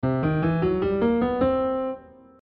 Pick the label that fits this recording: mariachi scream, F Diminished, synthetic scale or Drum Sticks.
synthetic scale